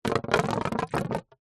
blow air into tube thin water gurgle dribble short burst; foley